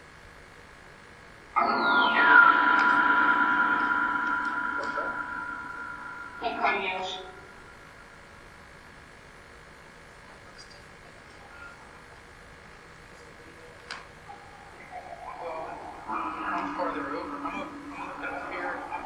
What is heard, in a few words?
Phasma Box; beaver area high school investigation